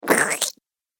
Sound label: Slimy Slug Monster Moves